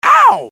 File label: Auw